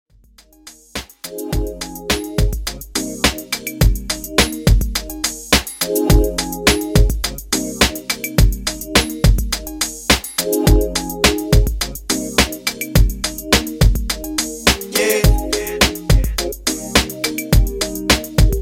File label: RnB, 105 Bpm; ReDrum